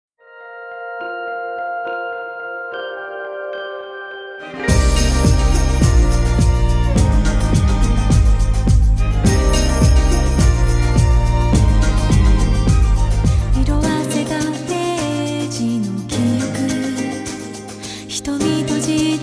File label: mellow Melody